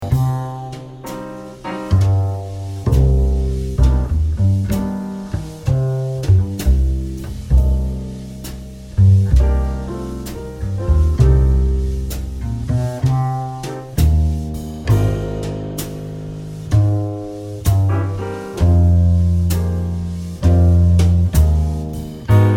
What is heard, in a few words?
key of Eb note C; note 6; jazz workout